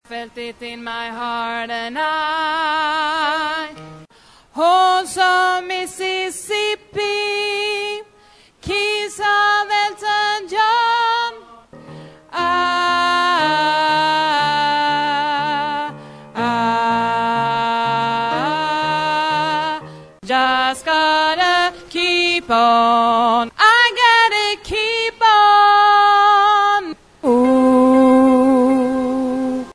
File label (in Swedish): bas